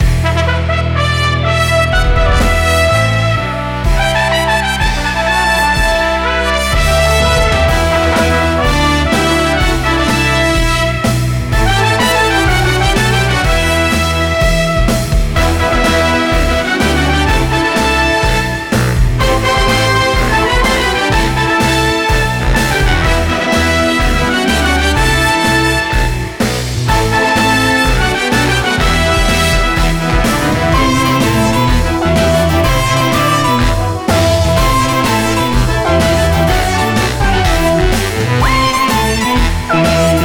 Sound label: Character Theme; Awesomenauts Ultimate Edition; Scoop